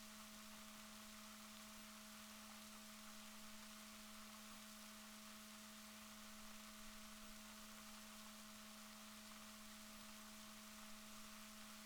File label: rorquals positif, Balaenoptera Physalus Chile